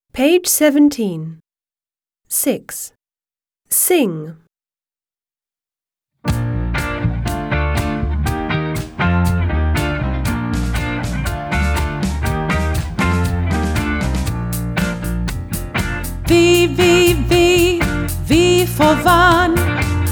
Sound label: song V to X, British; My alphabet book; Smart Book 1